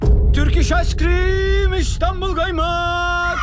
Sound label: turkish icecream istanbul kaymak